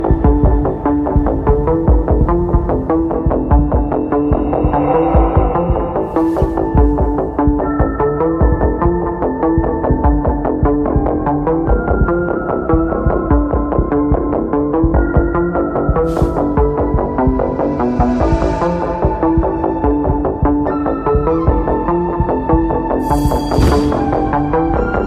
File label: english music